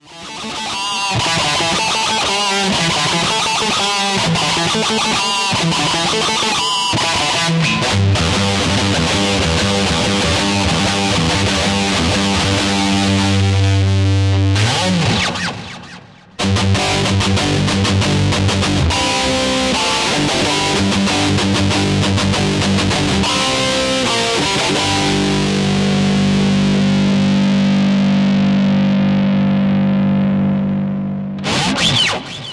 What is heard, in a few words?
music test sounds